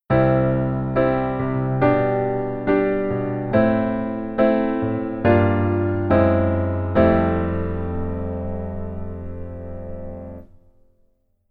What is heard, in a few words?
keyboard top note